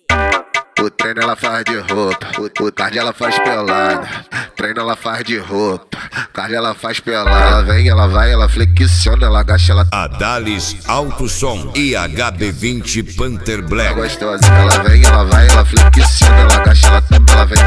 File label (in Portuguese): esp de cavalgada